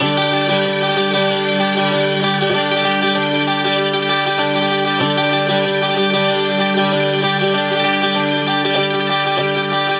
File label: 96 baglama G; diaspore